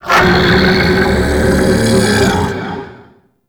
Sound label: Chain Reaction Beam Vocal; Warframe; Qorvex